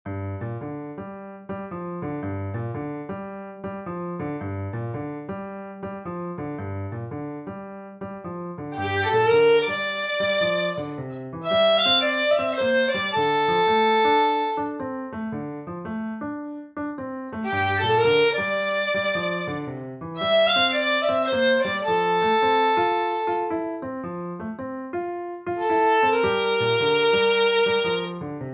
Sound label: Beginner Violin Piano 110 BPM